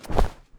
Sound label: bodyfall; the lost memory